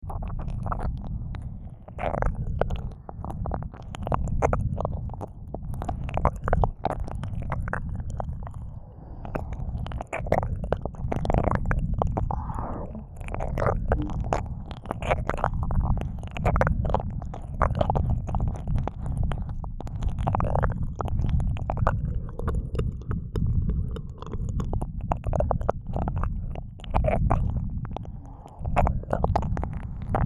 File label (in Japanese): 右耳舐めループ